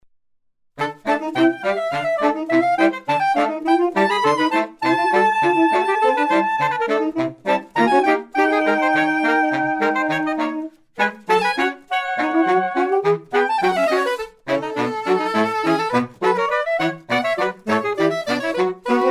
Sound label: playful, saxophone quartet